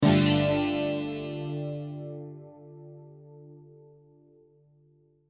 Tribal Hit Eastern, Tribal Hit Eastern Wind Chime Guitar Chord; Musical Sound Design; Blastwave